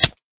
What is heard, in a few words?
hit, damage; eaglercraft-1.5